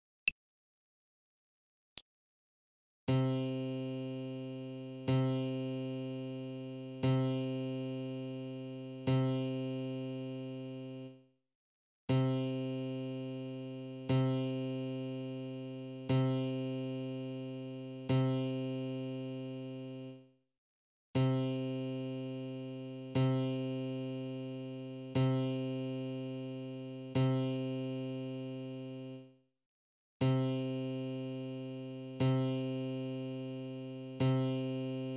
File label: c piano